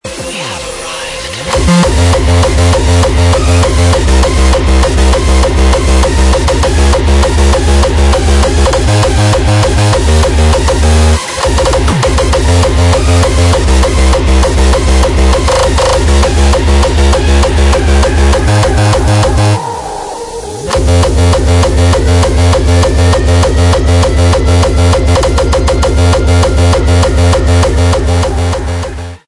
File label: frenchcore